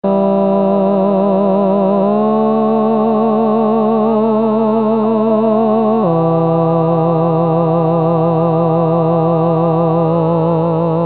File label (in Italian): Salmo 150 solo coro tenori; voci guida; corali in vaticano 2024